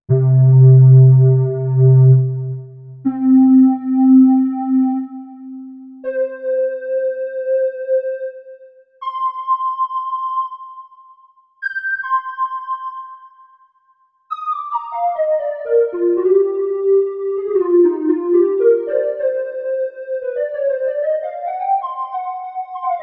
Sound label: lead